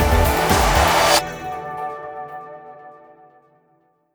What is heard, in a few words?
Big Win End; netent; starburst xxxtreme